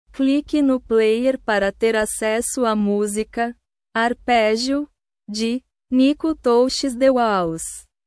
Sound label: arpegio